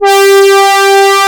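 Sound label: brass G5, synthesizer, analog brass; AKAI S6000 CD-ROM - Volume 1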